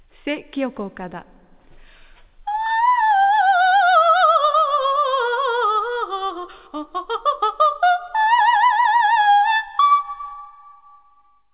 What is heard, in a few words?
vocal